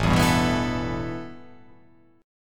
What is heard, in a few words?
A 7th Suspended 2nd